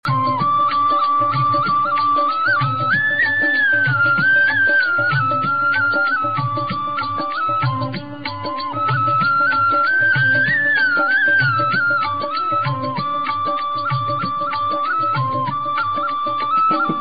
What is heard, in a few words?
Flute Heer Ranaja; Pk